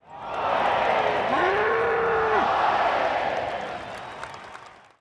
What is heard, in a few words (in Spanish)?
corrida; defusekids